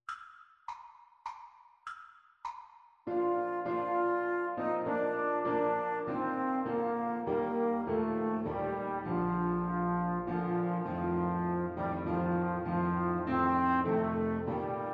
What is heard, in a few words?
away in a manger, Trombone Duet